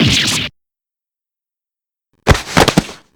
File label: faint full